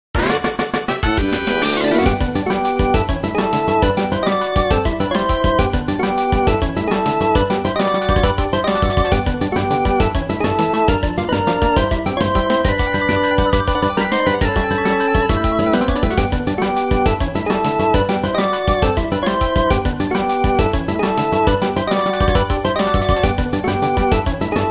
Battle victory trainer; Pokemon Solar Light & Lunar Dark 1.0.1

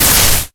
ground; Pokemon Solar Light & Lunar Dark 1.0.1

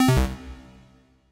PC close; Pokemon Solar Light & Lunar Dark 1.0.1

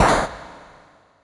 Battle damage weak; Pokemon Solar Light & Lunar Dark 1.0.1

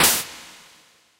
GUI menu open; Pokemon Solar Light & Lunar Dark 1.0.1

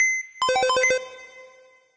PC open; Pokemon Solar Light & Lunar Dark 1.0.1

